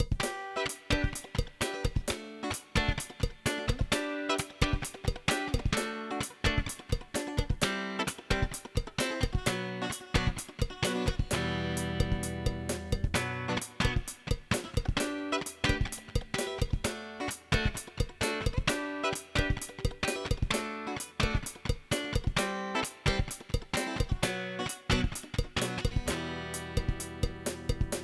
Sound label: disco; Le Vinvinteur